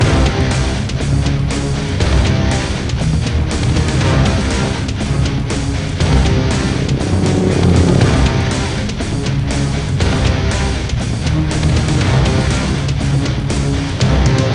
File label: Music Action; Emergency 2012; NDS